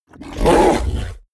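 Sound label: fishman groan, creatures; kreaturen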